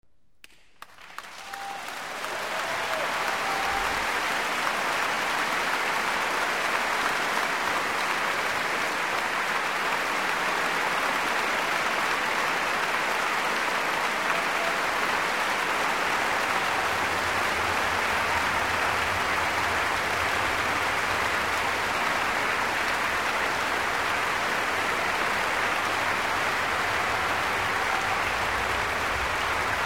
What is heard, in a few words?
Applause; Munich Philharmonic; Tchaikovsky - Mussorgsky